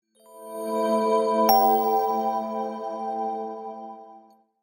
sms

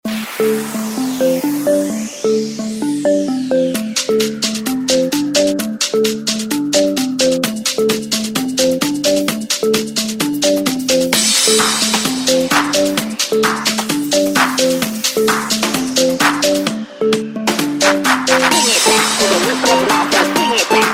Samsung ringtone Hard beat floor

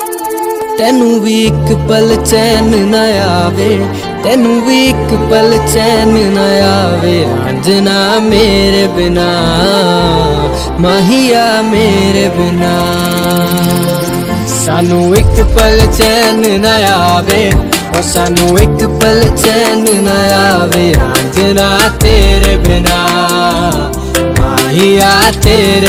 song ringtone

